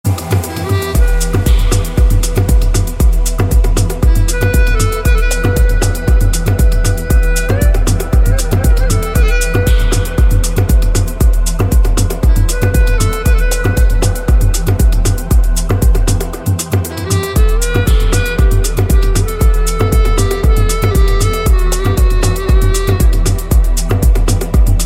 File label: best apple flute